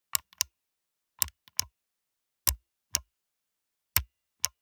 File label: Buttons Switches; soundbits